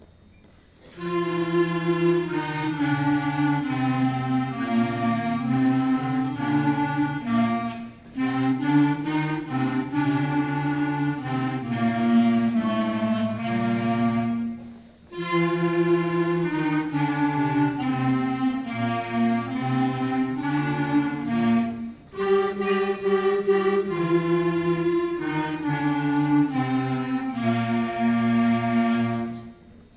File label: Clarinet Class Feature